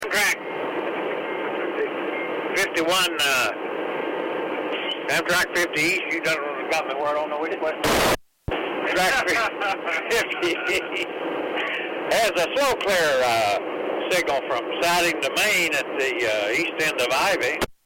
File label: engineer laughing; East Ivy